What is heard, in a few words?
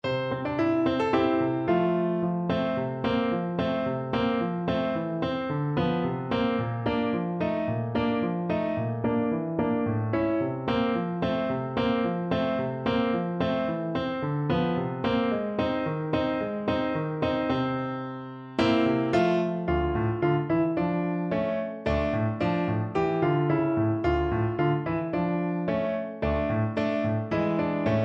soldiers chorus, Bassoon